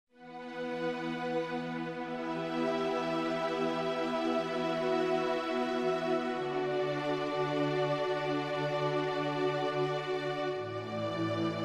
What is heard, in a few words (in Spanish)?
violin y piano